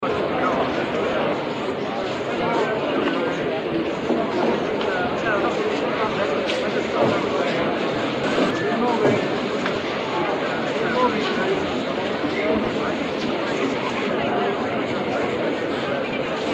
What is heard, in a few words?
atmo airport busy crowd